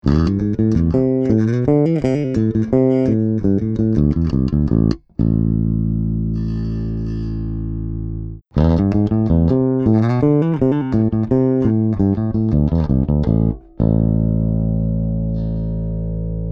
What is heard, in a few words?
bass